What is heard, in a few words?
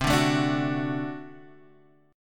D Major C, guitar